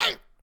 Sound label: die; capri